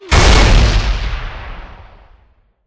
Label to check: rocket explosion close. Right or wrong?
Right